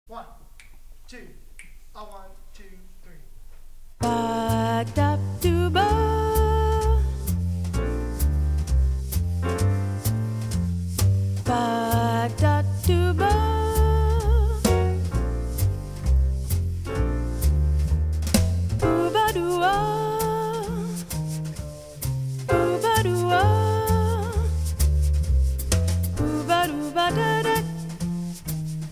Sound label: call and response, jazz